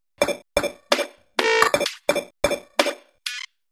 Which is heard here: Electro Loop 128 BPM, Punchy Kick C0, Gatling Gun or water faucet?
Electro Loop 128 BPM